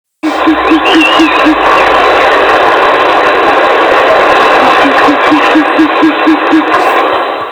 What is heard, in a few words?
Short eared owl male calling